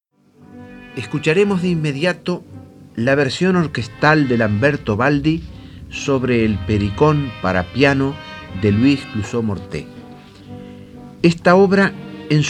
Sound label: piano; Luis Cluzeau Mortet; Pericon para piano; Lauro Ayestarán